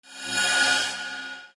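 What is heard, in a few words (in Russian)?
нежный звук для монтажа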